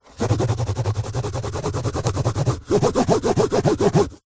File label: foley cardboard scratch fingernail fast; zapsplat